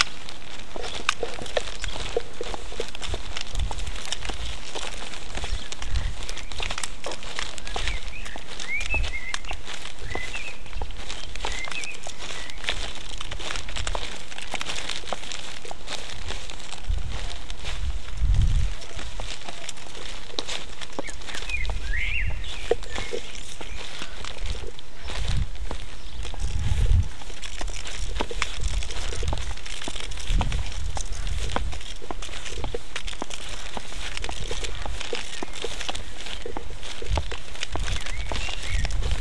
short stereo boo of walking and birdsong including blackbirds collared doves; and a pigeon